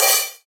Subtle Open High-Hat Sample G Key, Royality free open hi hat sample tuned to the G note.